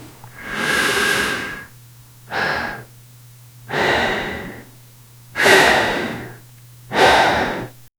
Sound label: multiple breaths through, gasmask